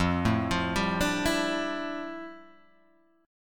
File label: F Major 13th, chord; arpeggiated